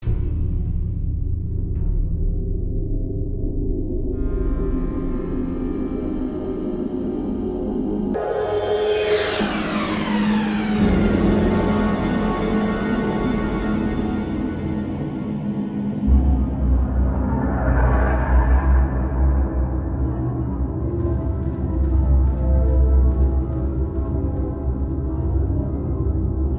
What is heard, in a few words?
AMBIENT; F.E.A.R. Perseus Mandate